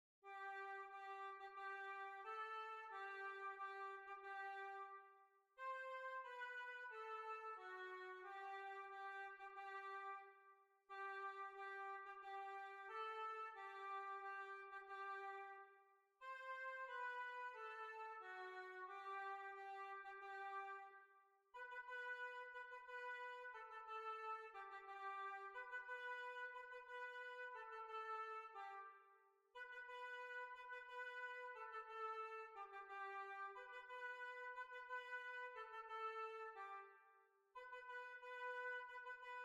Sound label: Swedish Masquerade